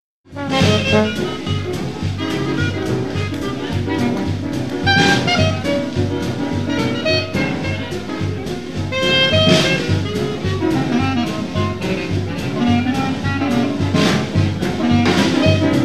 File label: Rag, Quartet